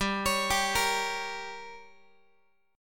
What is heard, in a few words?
G Diminished, Guitar; arpeggiated; JGuitar